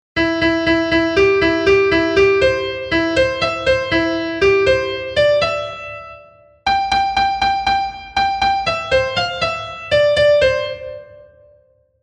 Sortie de Leau Bien Aller Solo, trompes

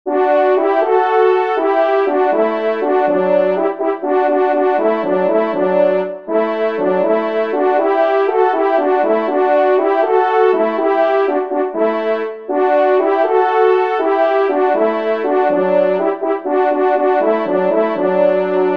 Duo, trompes; Plaine; Fanfares imposables en Concours

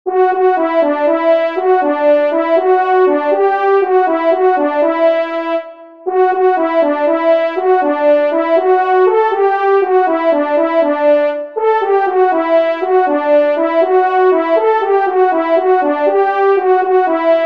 Caleche des Dames Solo, trompes; Fanfares imposables en Concours

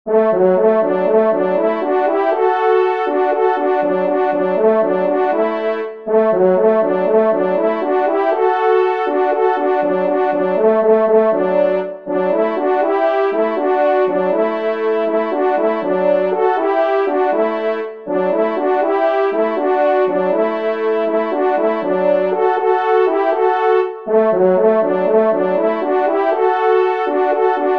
Laie Duo, trompes

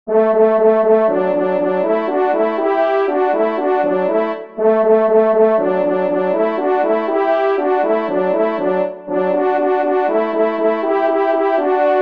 Retraite prise Duo, trompes

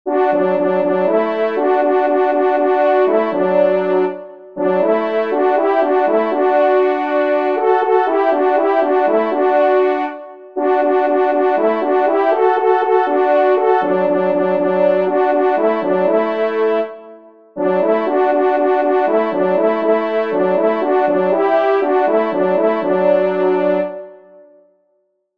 Animaux en compagnie Duo

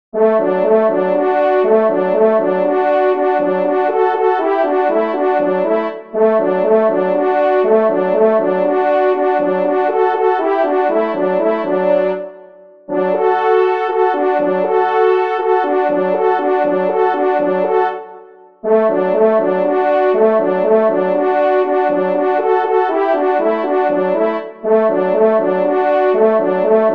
Reveil Duo, trompes; Fanfares imposables en Concours

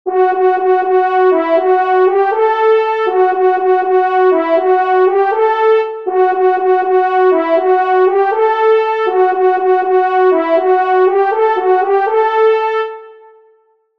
Hallali sur pied Solo, trompes; Fanfares imposables en Concours

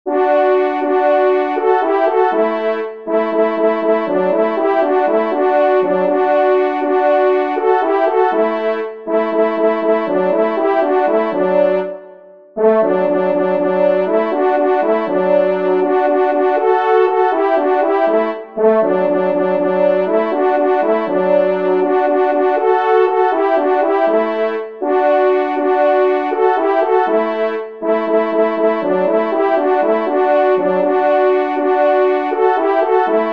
Passer l eau en barque Duo

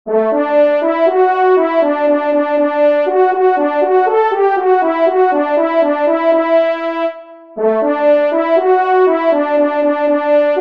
Dix cors Royale Solo, trompes

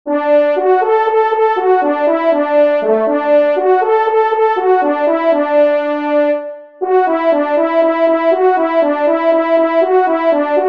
Rentree au chenil Solo

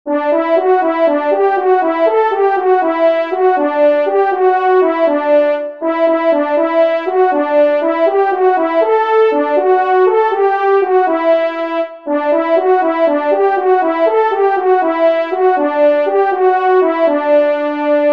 Cerf Tete Bourbon Solo, trompes; Fanfares imposables en Concours

